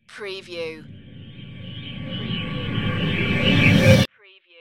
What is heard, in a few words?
ghost glitch riser